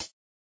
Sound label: noteblock hihat; mesecons